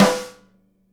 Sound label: SNARE RIM, Live Snares; E-MU Producer Series Vol. 8 – Platinum Phatt